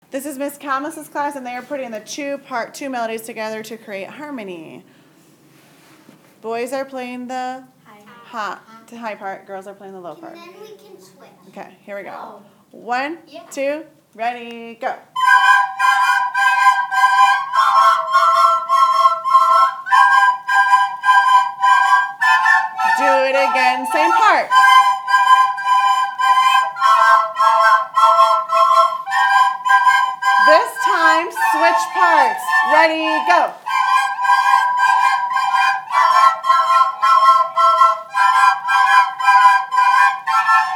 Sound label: 2 parts on recorder